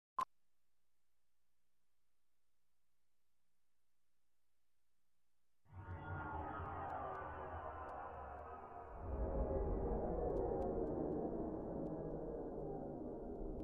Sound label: Synths